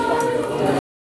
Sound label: Lead Vocals; Garage Band for iOS